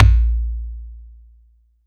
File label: BD